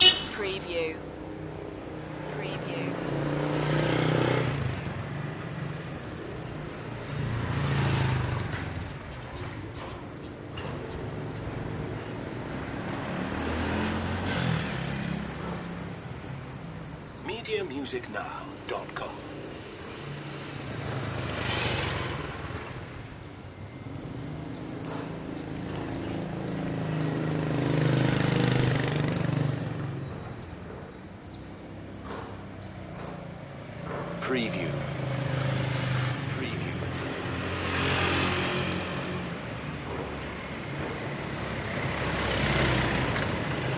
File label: motorbike street ambience; loop